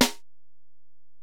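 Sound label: Acoustic Snare, Alesis DM-PRO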